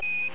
Quindar Tone

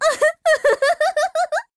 Giggles

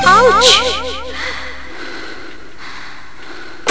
Ouch Sms

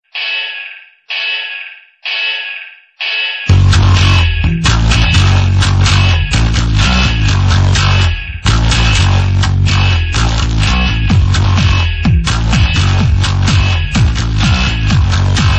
Grunge Ringtone

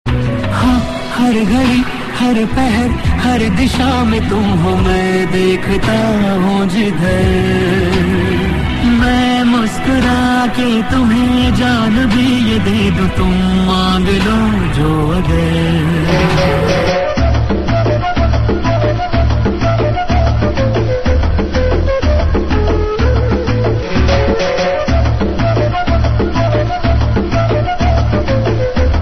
Sad Flute

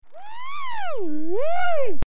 WOO weee

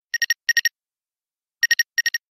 Ring 2x

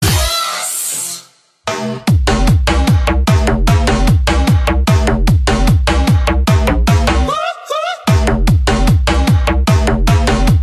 hard bass

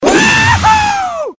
Bird; Yahoo Han Solo

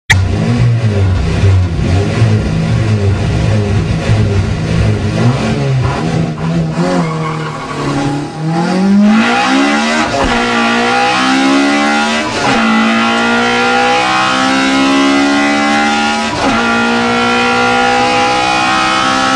Integra Type R

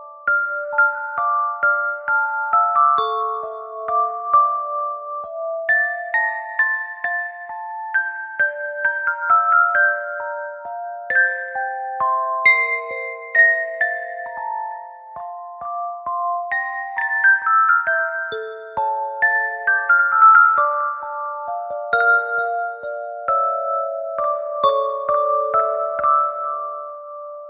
Sad Bell Ringtone

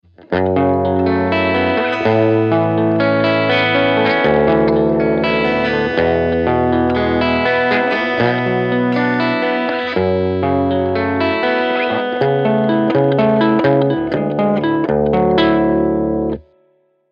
Acoustic Electric

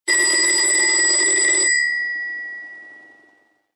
Old Phone Ringer

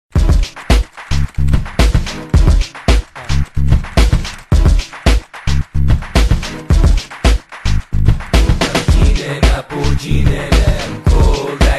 Hip Hop

